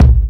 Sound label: smooth kick drum single shot c sharp key; Duration 0:01; Samplerate 44100 Hz